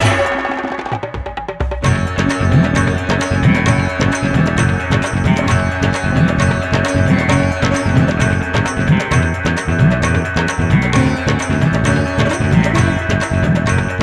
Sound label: Bari Sax